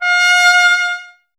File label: BRS TP F 0, Trumpet; Miroslav Vitous